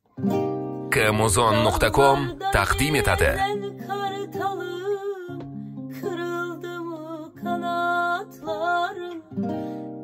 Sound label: acoustic